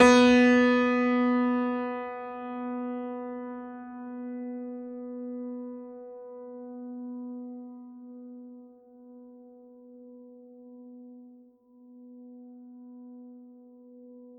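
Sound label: Classical Piano B2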